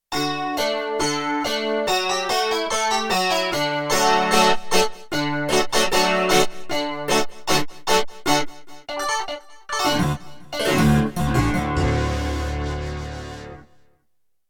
Stick Decay, Roland D-70; Factory Performances

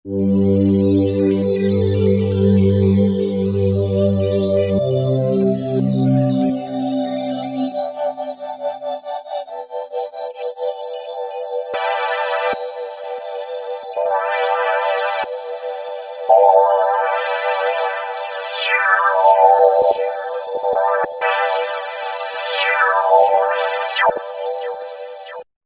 Roland V-Synth; NothernLight; Factory Patches

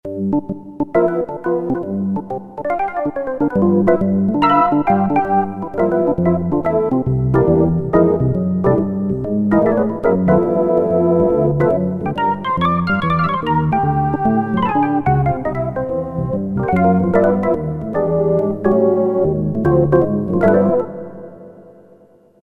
Elec Org 3, Roland MT-32

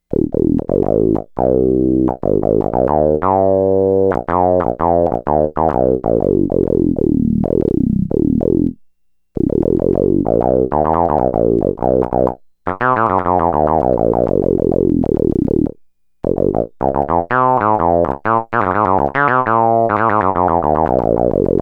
Frogman, Roland SH-2000

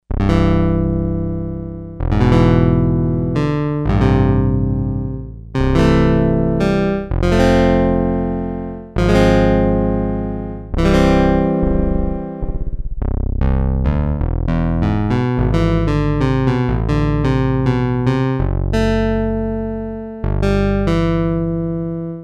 Acoustic Piano, Sequential Circuits Six-Trak; 77 Acoustic Piano - part 1; Factory Programs

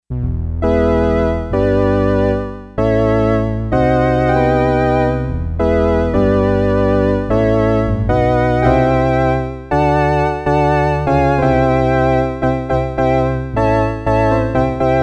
E.Organ 4, Yamaha DX11; Factory Preset Voices

